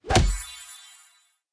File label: random Wild Arrows; warlords